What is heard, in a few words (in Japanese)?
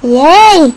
「イエーイ」; 音MAD; Cookie